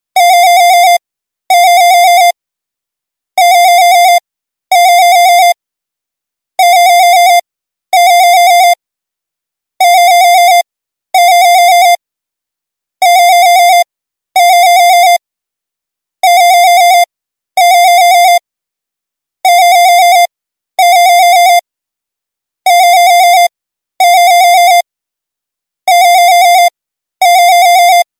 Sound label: Home phone